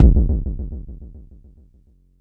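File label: Mod bass drum, Jomox Airbase99, Drum Machines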